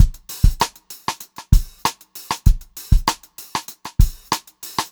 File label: Funk Groove Drumloops, funky; USB Soundscan vol.38; AKAI; 90 s Sample CDs